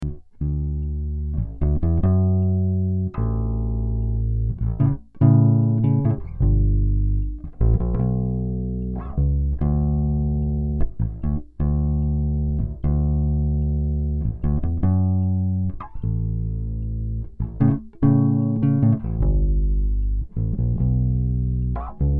Bass; admiralbob77

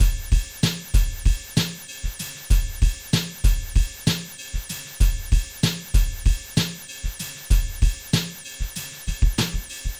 Mangling Patterns

